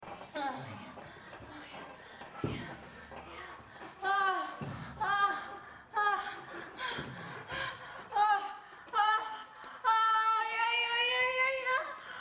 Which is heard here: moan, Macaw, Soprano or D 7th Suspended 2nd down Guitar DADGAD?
moan